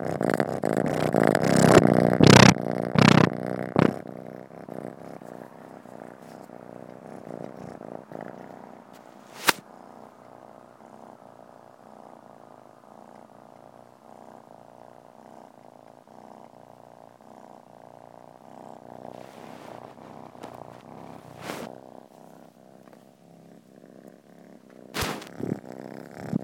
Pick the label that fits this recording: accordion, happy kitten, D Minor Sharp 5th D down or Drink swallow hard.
happy kitten